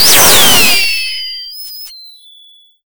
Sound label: electronic